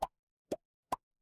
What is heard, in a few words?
cartoon pop